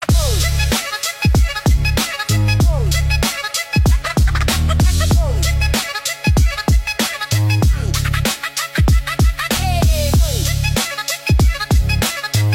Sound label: nature beats vibes